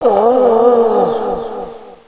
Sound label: pain